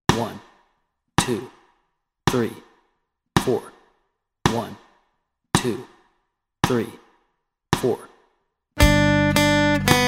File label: Camptown Races Melody Track, guitar